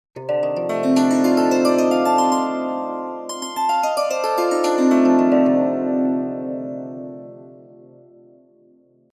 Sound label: swarmandal abhogi